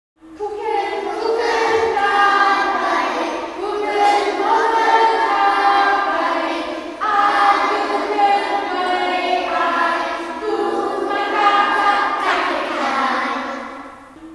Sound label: milk and rice song